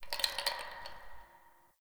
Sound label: Bar Glass Move; Warframe; Nineteen Ninety Nine; Hub; Hub Pub